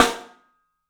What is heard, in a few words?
MARCH, 3D Drums; 3D Sound; MARCH XTREM